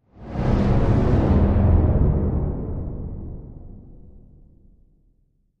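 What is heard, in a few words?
Brake Electric Beep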